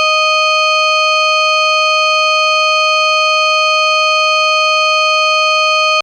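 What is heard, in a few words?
FM Sawtooth DX100 D#8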